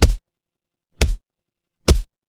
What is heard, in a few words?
transition braam